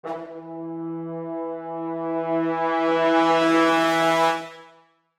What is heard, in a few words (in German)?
blasinstrument crasendo